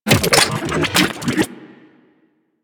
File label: Briefcase Launcher Reload; Warframe; Weapons; Corpus